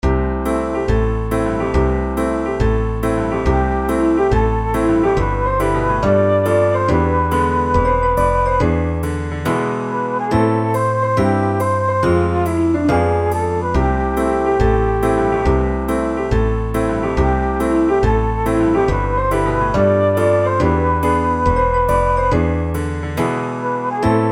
bouncy show tune

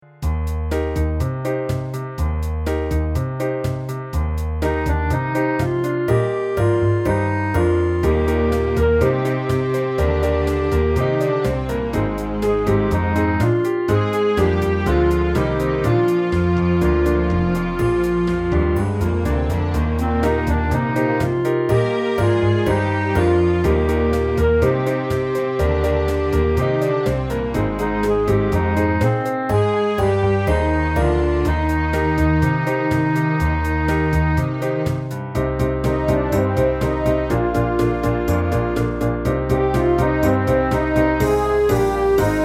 Light Pop Show Tune

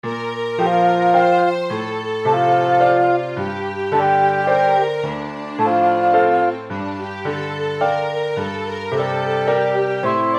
classical

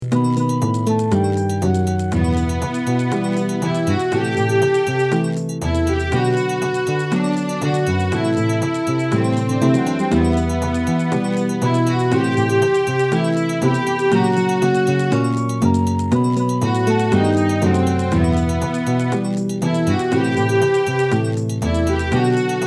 Carnival Bahia Brazilian

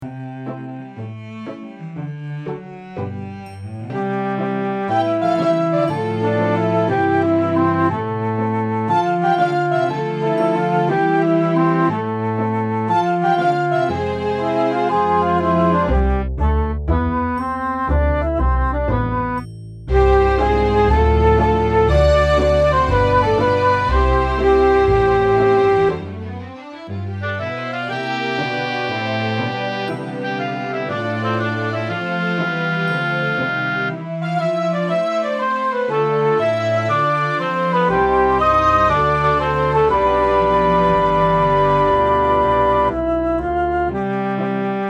light show tune

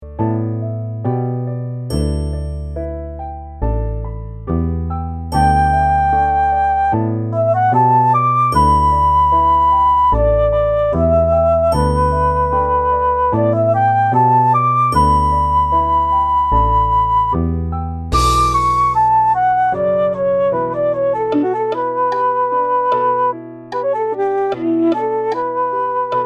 Blossom Dance, Japanese Feel

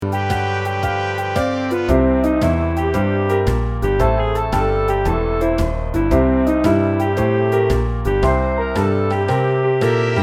Bluesy

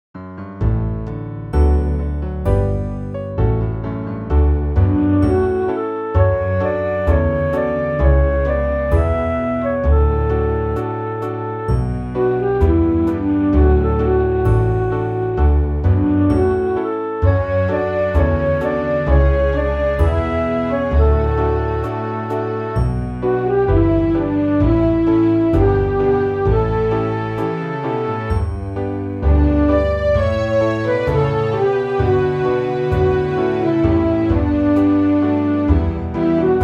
relaxing

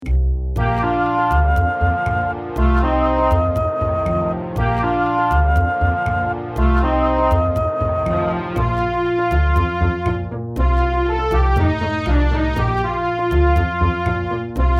Happy Latin Beat

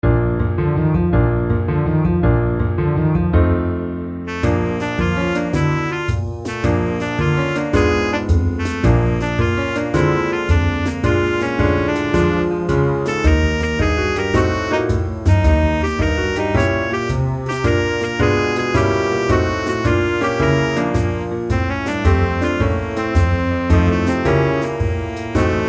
jazzy blues

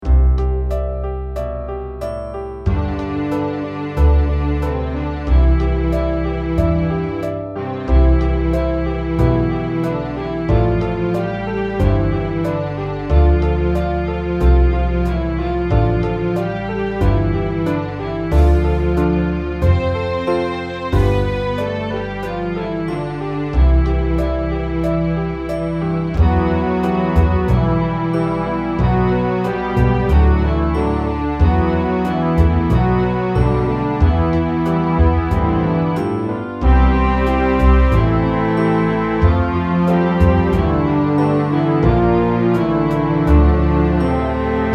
Serious Show Tune

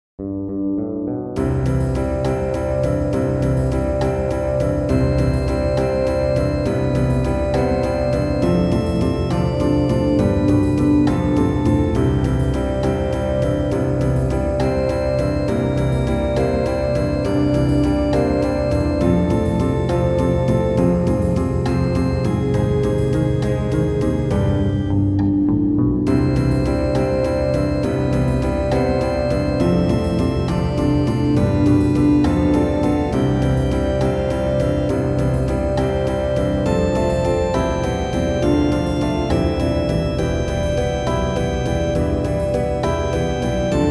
60s style

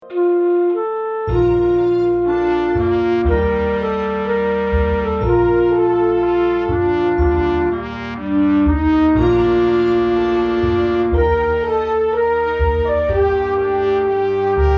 Inspirational

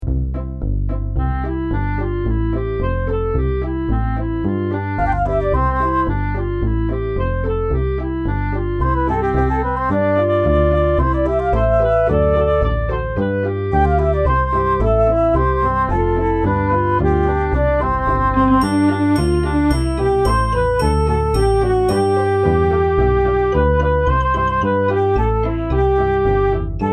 upbeat show tune

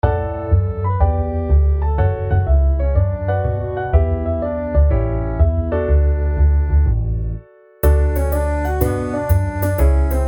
60s TV Theme

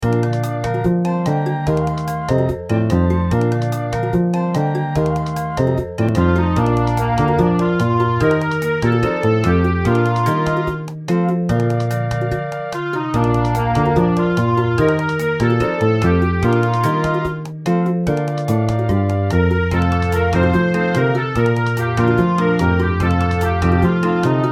Rockin Calypso Happy Carribbean

